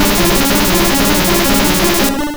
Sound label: Meditate